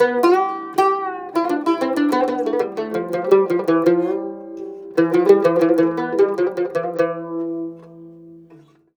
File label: CUMB, CUMBUS, Mediteranean Traditions